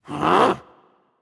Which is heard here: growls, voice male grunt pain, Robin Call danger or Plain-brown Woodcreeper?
growls